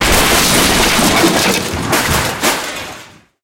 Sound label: wood metal big; tanks; War Thunder